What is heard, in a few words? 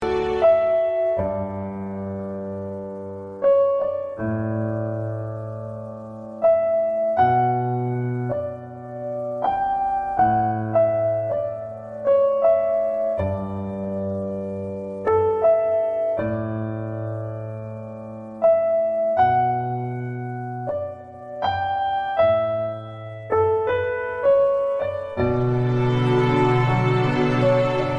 Sad Ost; One punch man